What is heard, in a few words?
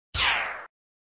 Sound SFX, flipper